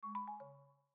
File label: alert